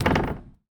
Street Ball Glass Back

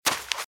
Footsteps - Sand - Dry - Run - Right; RadwinIsland

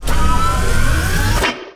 Scifi Power Up Mech Arm; Energy & Forcefields

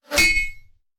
Bullet Impact; Sound Ideas; Guns

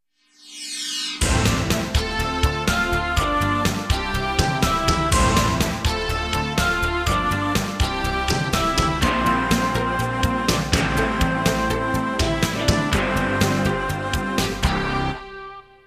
Jingles; 90 DDD Jingles

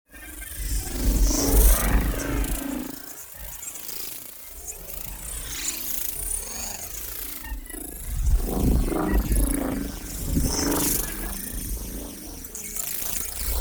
MOVEMENT Photon Cloud; NEON; boom library

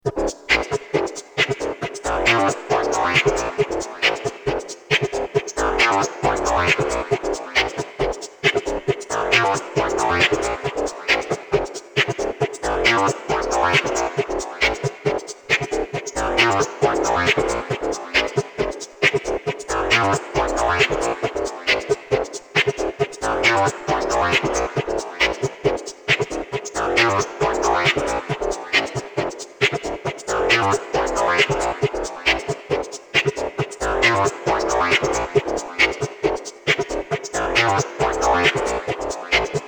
Electro; RoosterFX

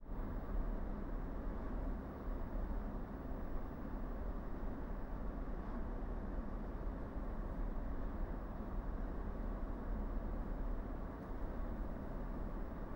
air condition; devices accessories utilities; NS Studios - ultra sound collection

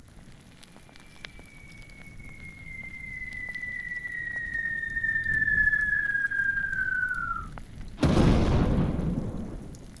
AMB; Curigos; BLIND QUEST - The Enchanted Castle

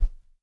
WHOOSH Deep; mono; Universal Sound FX; WHOOSHES

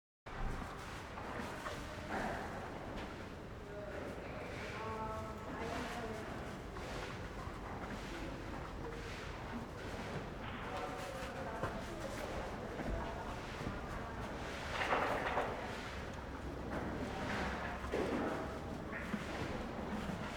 Animal effects snorts grunts farm ambience

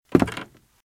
Footsteps - Wood - Creaky - Walk - Left

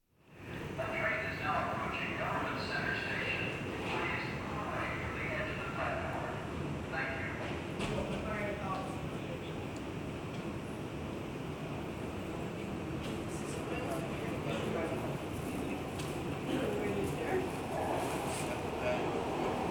Metro station; KMP Film Video Sound Effects

